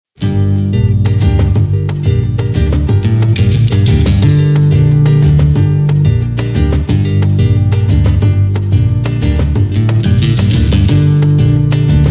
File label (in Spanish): pista, pistas rumba flamenca